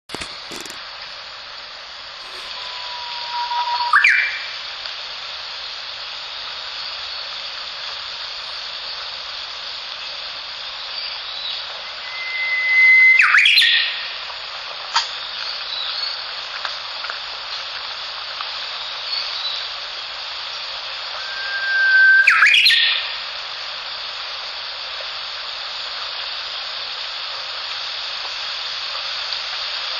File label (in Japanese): ウグイス